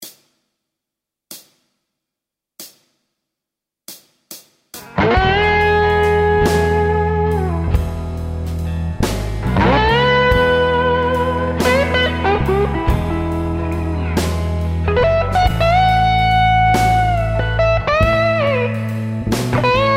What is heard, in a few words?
Solo